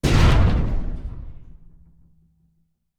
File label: hit driver hit police; Blind Drive